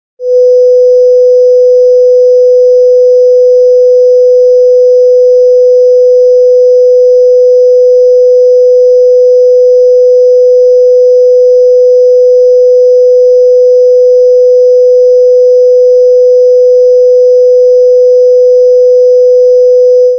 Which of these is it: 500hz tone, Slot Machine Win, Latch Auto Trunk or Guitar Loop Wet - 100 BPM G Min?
500hz tone